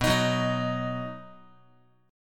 B Major, Guitar, 4A,4E,5A,5D,5G sharp,6C sharp; strummed; x,7,6,4,7,x; JGuitar

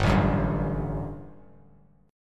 G Major 7th Suspended 2nd, Bass Standard; GM7sus2 strummed; 3,0,4,0

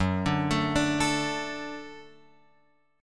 F sharp Minor Sharp 5th F sharp; F#m#5 Chord {2 5 X 2 3 5} arpeggiated

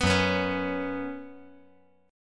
Gb Suspended 4th Gb, down

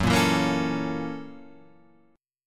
Gb 7th Sharp 9th Gb, Guitar Open F; strummed

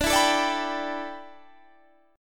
A 9th Suspended 4th, D

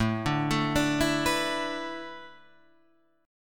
A Suspended 2nd Suspended 4th

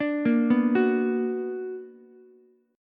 B Minor Major 7th, Ukulele; arpeggiated; JGuitar

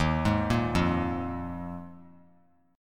D sharp Suspended 2nd Suspended 4th D sharp; arpeggiated; JGuitar

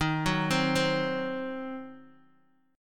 D sharp Minor 6th D sharp down Guitar Open D

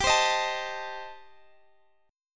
D sharp 6th Flat 5th G

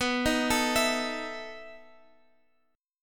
B 11th B Guitar Half Step Down, chord

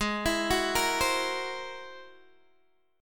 G sharp Augmented 9th G sharp Guitar DADGAD

